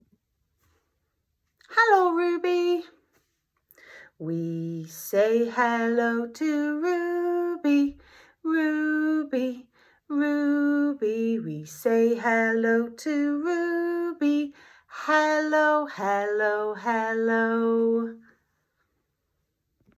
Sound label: morning song